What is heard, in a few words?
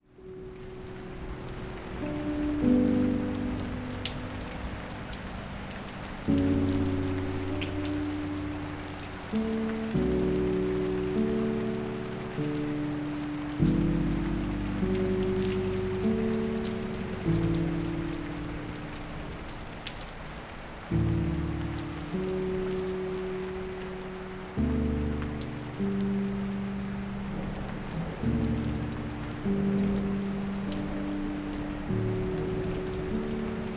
Rain Sounds